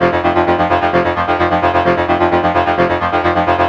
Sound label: brass ens f2; ebrass ens; artmatic designer; u and i software